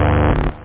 synth; da capo vol1; instruments; chords

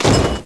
cash bomb; Coin Critters

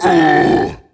male hit; half ogre

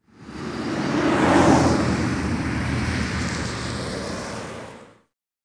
traffic

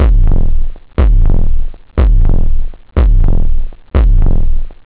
hard, drums technobd

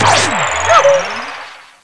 Get Last Bonus Key; Monkey Shines 1.2.1

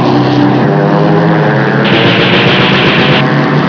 Air Strike; Patton Strikes Back

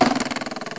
click

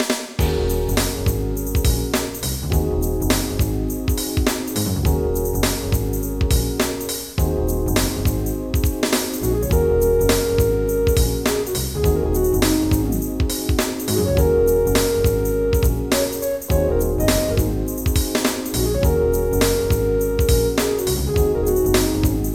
Groove

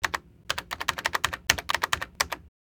computer Type; Singles; French Version

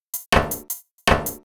PERCUSSN, Drum Bass, 160; eJay

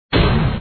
asteroid explode; operation graduates

